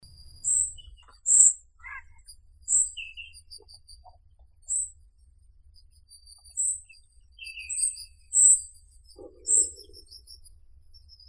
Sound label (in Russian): drozd sizii turdus hortulorum